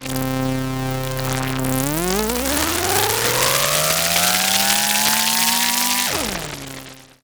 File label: Meltdown, techno drum fx; Single Hits